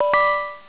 incoming msg, ICQMobile

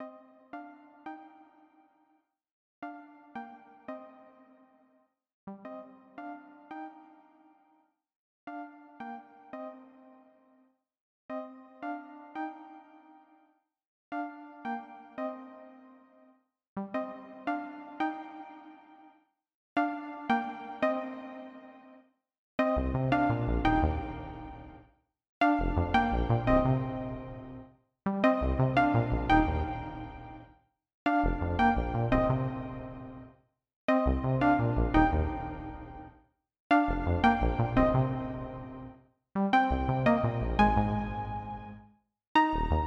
170BPM Blip

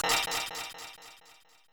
square death; OneShot Fading Memory

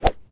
iron hit strong

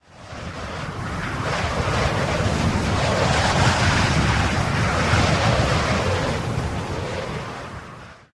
high altitude; OneShot Fading Memory

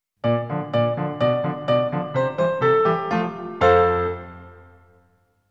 Bonus Screen Clear Fanfare; NINTENDO

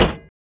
car land

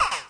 KWAAK; MioMap C220; Rabbit-Wars

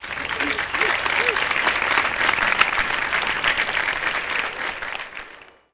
crowd high

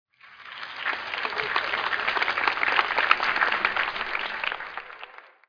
crowd medium

